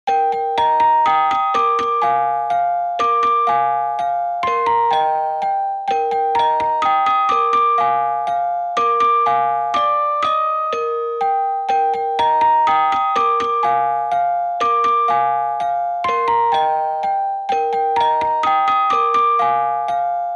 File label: Ice Cream Truck, Little Sister Waltz